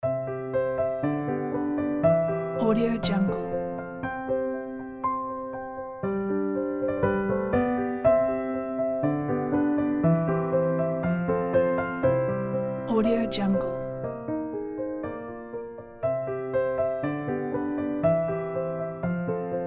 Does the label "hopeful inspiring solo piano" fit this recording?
Yes